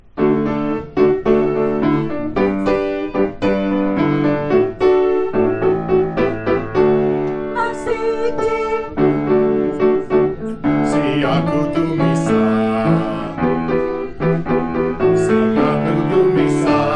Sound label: Tenor; Amen Siakudumisa; choir